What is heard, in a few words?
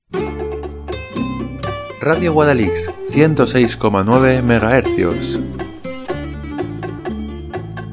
riff